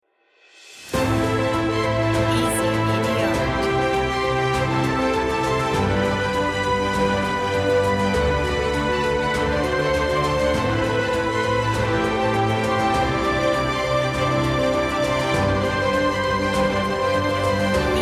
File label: action trailer short